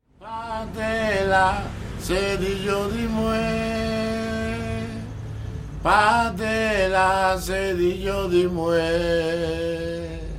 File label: Merengue